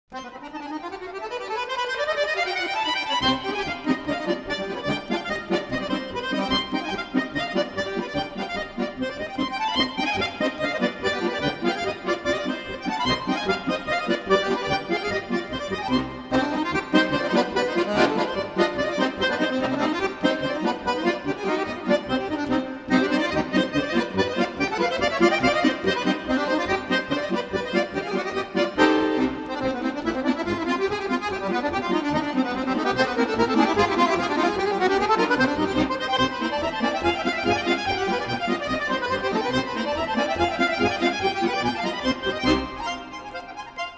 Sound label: Polka; Zavadsky Korelofinsk